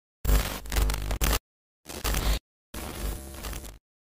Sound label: Glitch Sound Effect